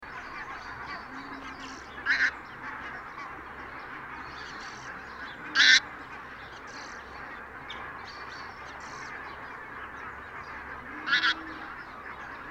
pfgoo FC HONK FCD HONK; caerlaverock

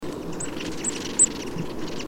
ebtit caeruleus SOC FC; werdau

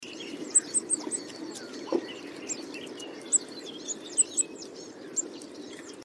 caeruleus; haselb

